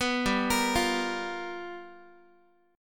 G 7th Sharp 9th G chord, Guitar; G7#9 arpeggiated; 14,15,13,15,x,x

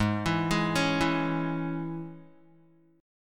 Ab Suspended 4th Sharp 5th Guitar, chord; Absus4#5 arpeggiated; 4,7,6,6,4,x; JGuitar

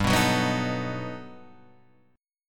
G Augmented Major 9th

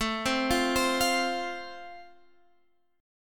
F Major, guitar chord

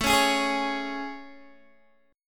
A Major 7th A, Guitar, Open D

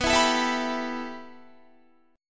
B 7th Suspended 4th Sharp 5th, Guitar; B7sus4#5; strummed; JGuitar

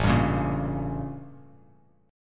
A 7th Flat 9th A, Bass Standard; A7b9 strummed; JGuitar

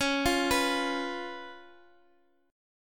Db 7th Db down Guitar Open D

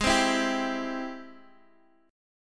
G sharp Augmented Major 7th, Guitar, Open D; x,x,6,6,7,5

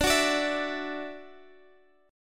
D-Major Add 9th D

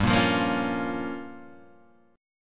G Minor 7th Flat 5th, Guitar Half Step Down

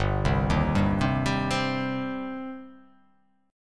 G Suspended 4th Sharp 5th, Gsus4#5 chord; arpeggiated; JGuitar

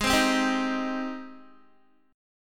G sharp Major 7th G sharp, Guitar; G#M7 Chord

